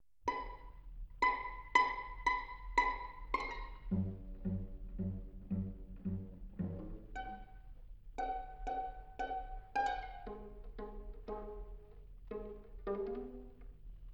Quartet B Flat Major, String Quartets; Béla Bartók

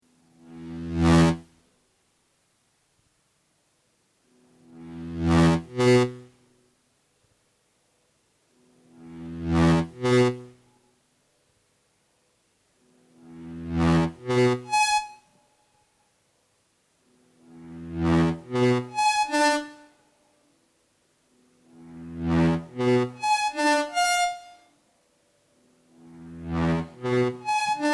Loop III

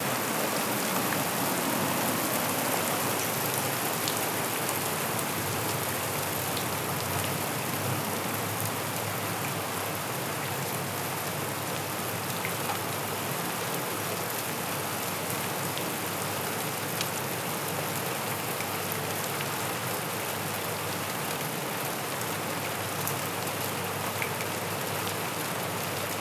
Hail, Field Recordings Oregon Rain; Omni Gardens

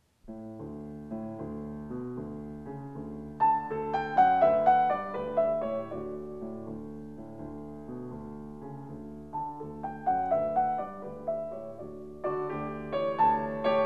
Piano Sonata No. 15 in D major; Wilhelm Kempff; Beethoven; Piano Sonatas

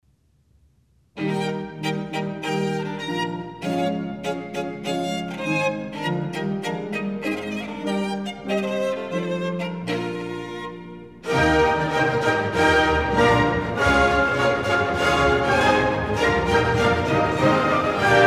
Scottish Fantasy for violin & orc; Kyung-Wha Chung; Bruch

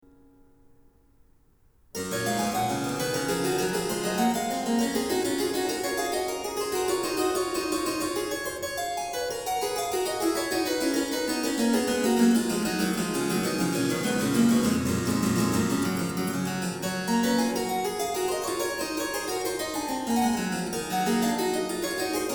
Harpsichord; Bach, J S; Goldberg Variations; BWV 988

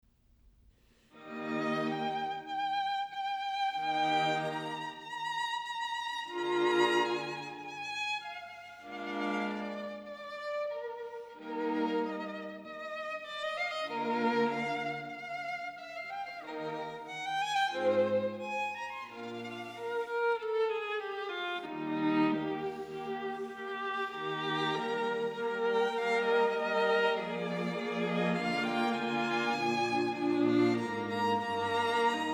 String Quartet in B-Flat Major Adagio ma non; String Quartet No. 6; Op. 18 No. 6; Ludwig van Beethoven; Beethoven - String Quartets Nos. 1-16

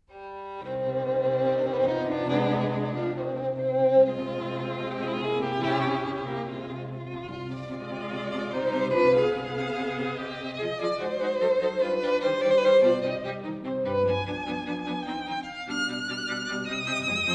String Quartet in C Minor Allegro ma non tanto; String Quartet No. 4; Op. 18 No. 4; Ludwig van Beethoven; Beethoven - String Quartets Nos. 1-16

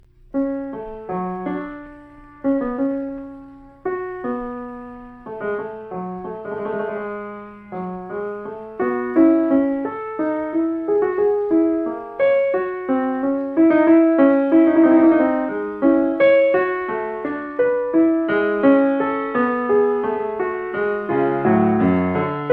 Clavicembalo ben temperato Fugue In F Sharp Minor; J S Bach

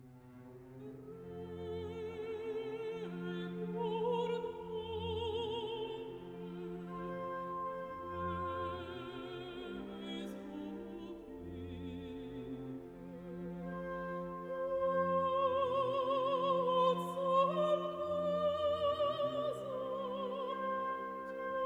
Dies irae. Recordare (Soprano, Mezzo-Soprano); Giuseppe Verdi; Verdi Requiem

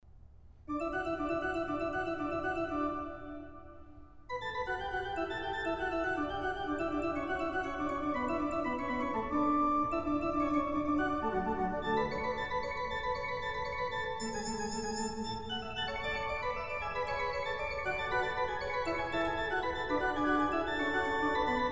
Prelude and Fugue in D major; Bach

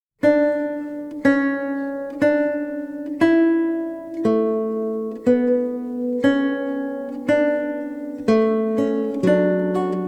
Fugue, E-Flat Major, Lute; Prelude, Fugue and Allegro; BWV 998; Bach; JS Bach - Complete Music for Lute

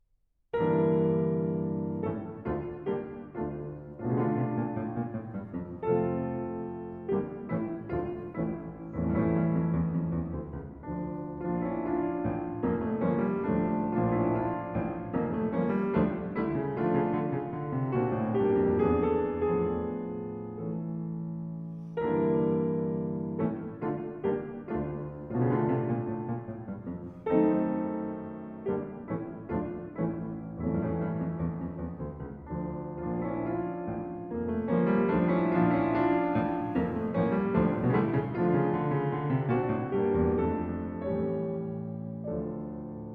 Preludes Op. 23 No. 3 in D Minor

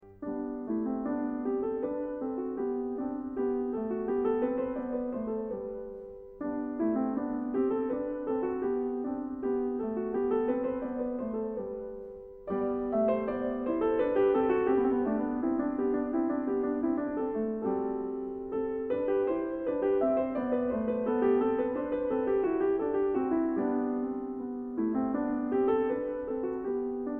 Gavotte II (Musette); Bach; English Suite No. 3 in G Minor, BWV 808

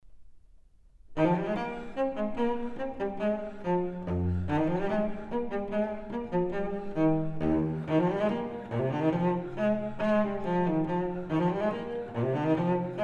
Suite for solo cello No. 4 in E

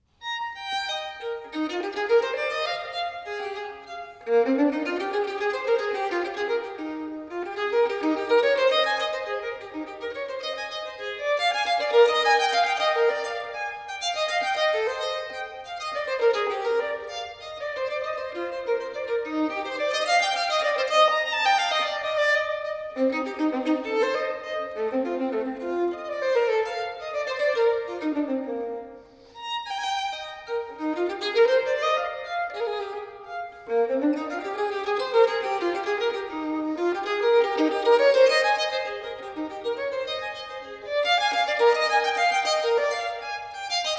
Violin Partita No. 3 in E Major, Giga; BWV 1006; Bach

